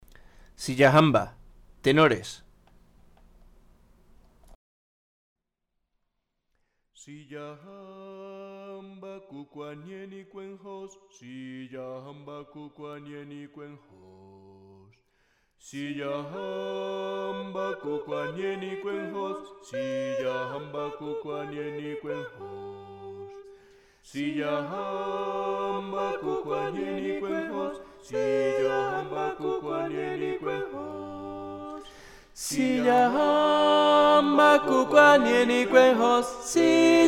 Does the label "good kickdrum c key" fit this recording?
No